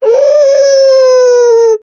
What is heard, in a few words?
dog crying sound